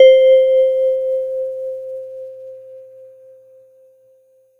Yamaha PSR-22 Vibes C5

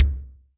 Deep Kick

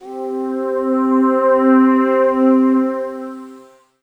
Korg M1 SoundTrack C4

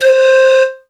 Kawai K1r Pan Flute C5

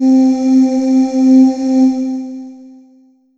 Roland JV-2080 SynVox C4

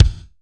Kick Drum

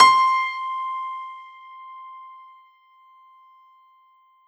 Yamaha MU90R Concert Grand C6

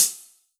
Yamaha RM50 Closed Hi Hat

